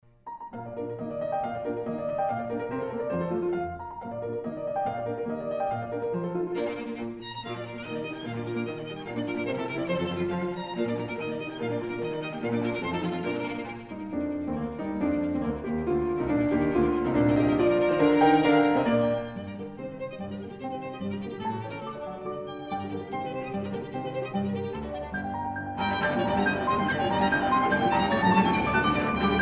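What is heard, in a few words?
piano trio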